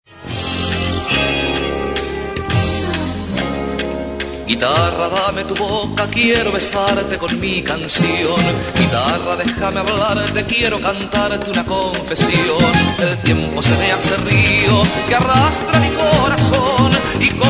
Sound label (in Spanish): vamos guitarra; enrique llopis; viajeros